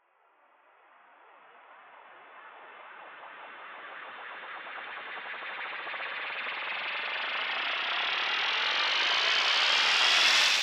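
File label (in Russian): вжух шумный длинный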